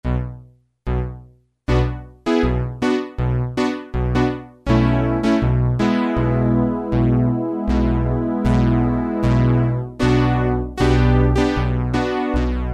3 Tap Pan

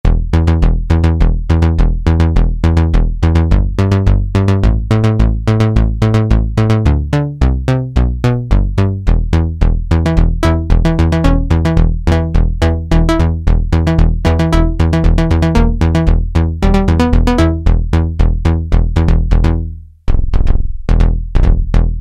Synth Bass I, Roland Juno-106; Factory Preset

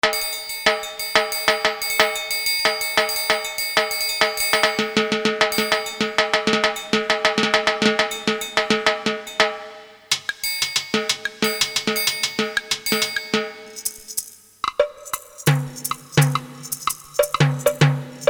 Mix, Latin Percussion

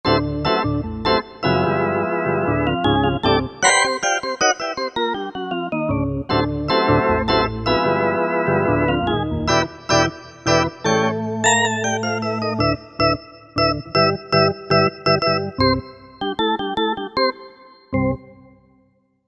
Jazz Perc, Korg MSC-9S Organ

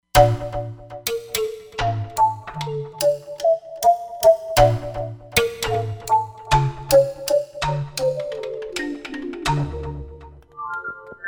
Air Pluck, Roland Fantom XR

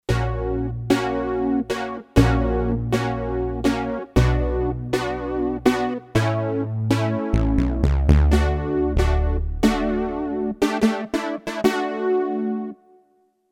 Matrix 12; Factory Programs

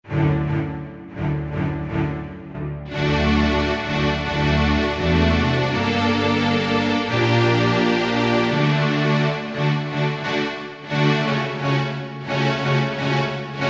The Strings, Korg 05RW

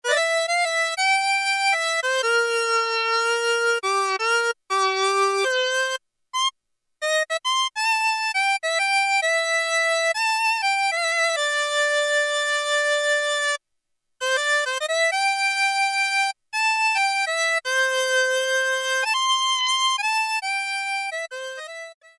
HARMONCA, Kawai SX-240

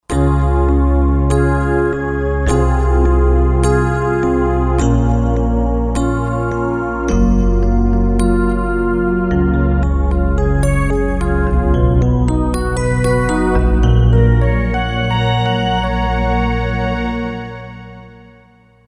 Roland JD-990; Factory Patches examples; Snow Remember

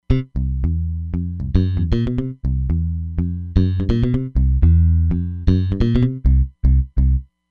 X Mute Bass

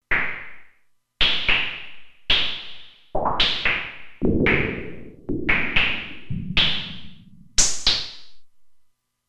Noise Shots, Roland Alpha Juno-2; Original Sound Chart patches

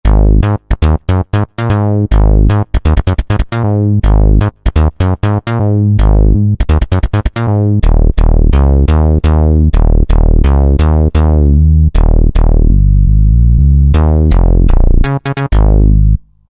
Rubber Bass, Roland JD-990; Factory Patches

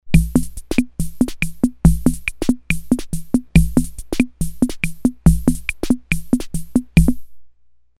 MIXED, Ace Tone Rhythm Ace FR-2L

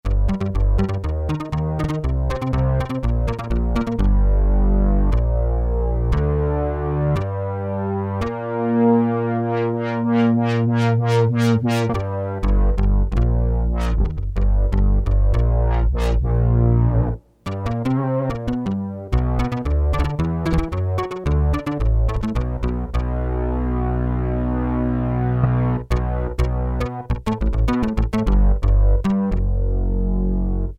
Syn BASS; Korg DSS-1

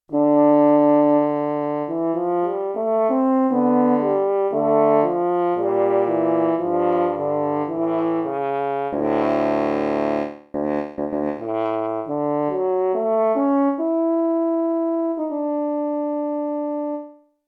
Horn; Tuba + Horn 1

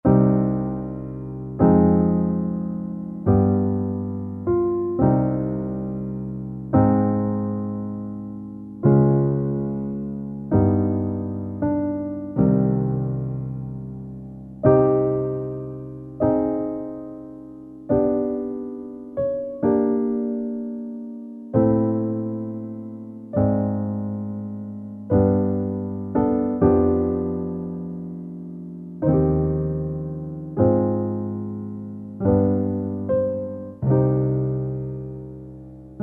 Euro Classic, Roland SR-JV80-09 Session

